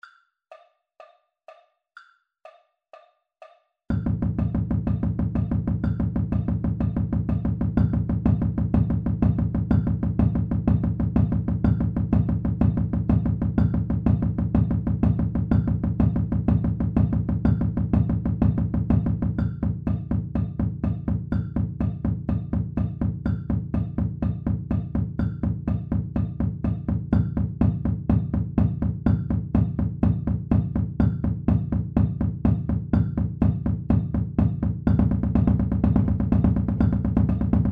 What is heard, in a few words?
124 bpm Bass Drum